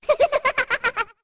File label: Laugh Play; Skull Kid laughing while playing